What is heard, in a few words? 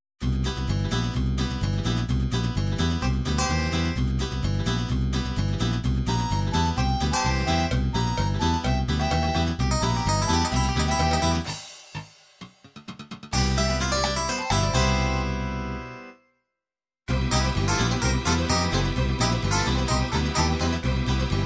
4/4 blues, midi